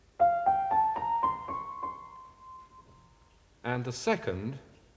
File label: Music Example (Piano)